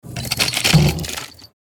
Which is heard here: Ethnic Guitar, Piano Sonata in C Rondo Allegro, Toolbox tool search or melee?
Toolbox tool search